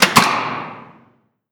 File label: breaker off